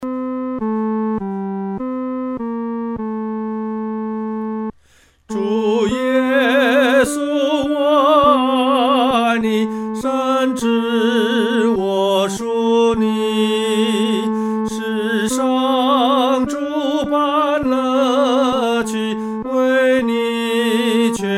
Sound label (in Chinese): 独唱 第三声